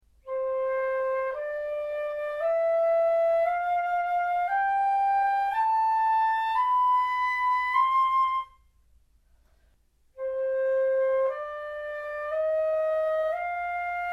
gamme

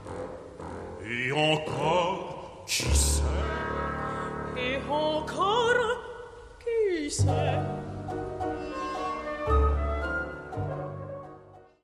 voix grave

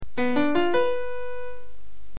locrien accord

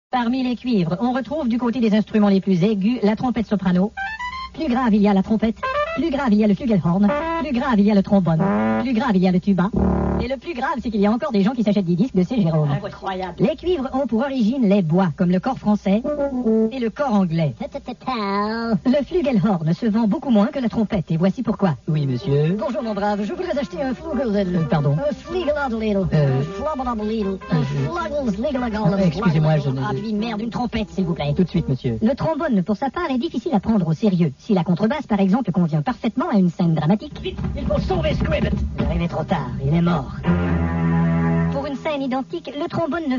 trompette, humour musique

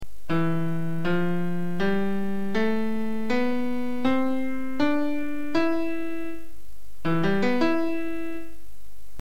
phrygien

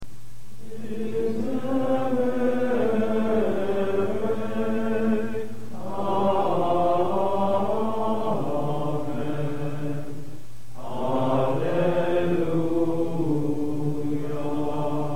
gregorien

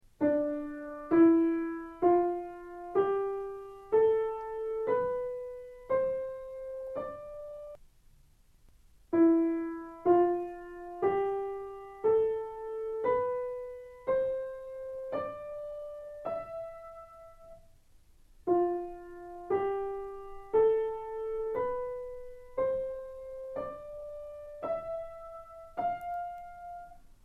tous modes

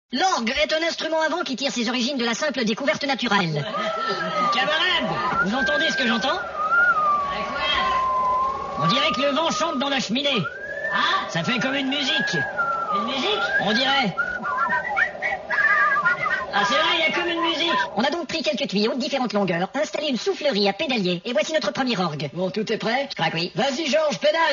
orgue, humour musique

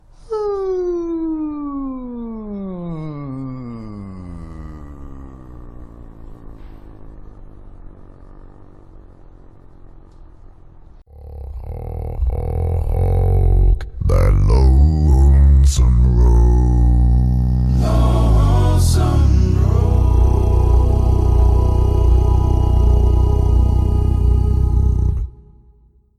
voix grave

